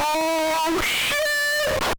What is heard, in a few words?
MSX Death Sound; Oh Shit!; Aackosoft